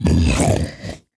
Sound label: Bloat Idle; Bloat Santa